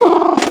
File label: Cat, Wavetables; Cymatics; Serum; Animals for Serum - Gold Edition